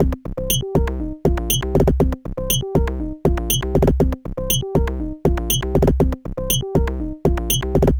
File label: adlib loop